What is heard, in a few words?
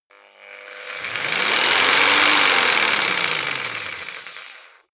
jigsaw engine medium slow medium length